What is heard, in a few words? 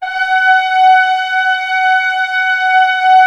Orchestra F#4; Sound On Sound; Peter Siedleczek's Orchestra